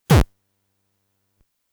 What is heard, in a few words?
PO 20 ARCADE 8bit Kick C; Audiowanderer